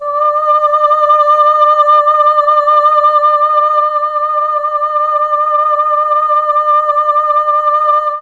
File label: D3 FEMALE, mellotron; CHMB FEMALE